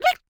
Globe Bird Hit; angry birds rio

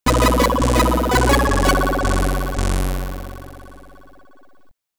Game Over (SID Stereo); Target Renegade; Commodore 64

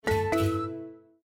Logon Sound; Savanna

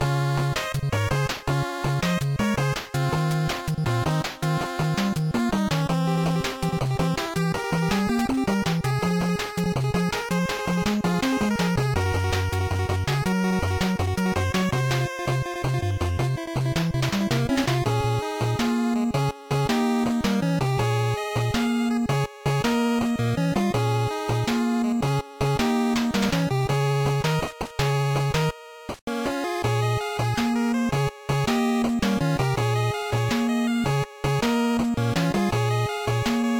BGM 8bit; Mighty No. 9; 8-bit soundtrack

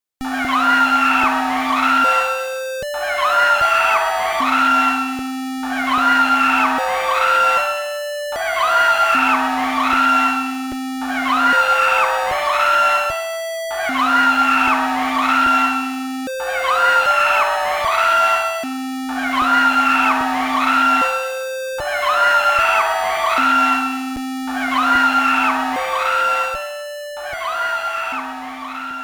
Speaker Test; Dino Rex

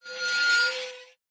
Cart brake

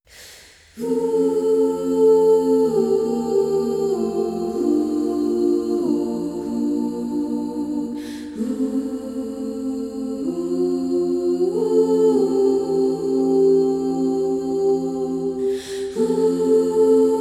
humming version, Nearer, My God, to Thee; Angel Core

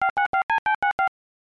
Phone; cobra mission panic in cobra city; ibm pc at

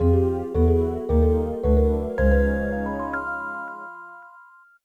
Jingle Game Intro

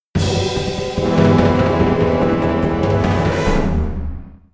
Fight Over; Kiln's Laboratory - Kiln's Hideout - Fiery Furnace

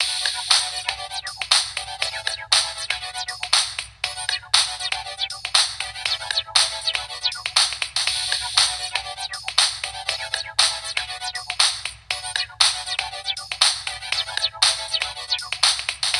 Tv Music; Prison Architect

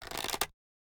Scatter Shot Bow; Ranger

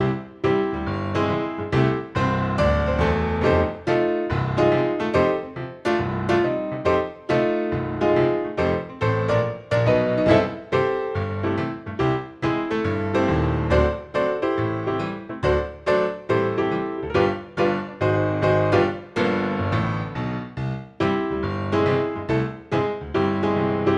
cutscene piano body

